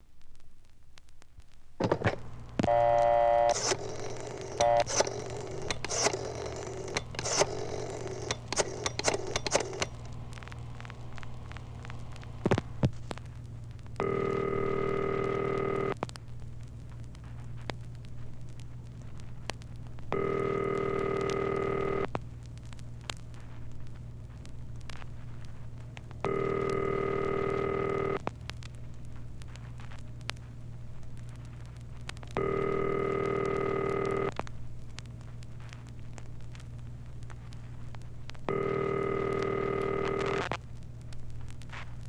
Phone Dial Tone, Dialing, Ringing

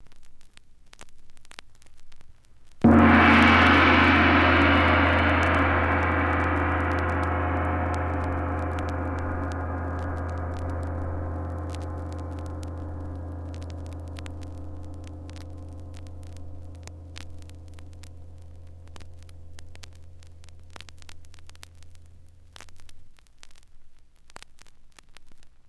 Chinese Gong

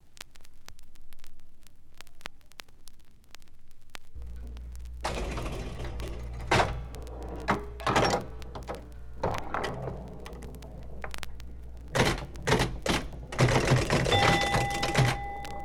Pin Ball Machine

